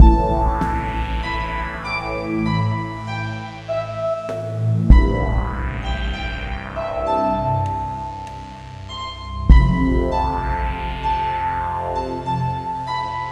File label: harp thing